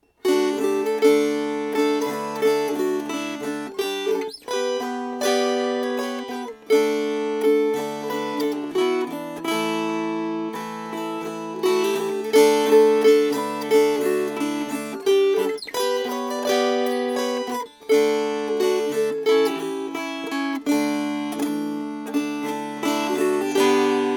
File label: Strummed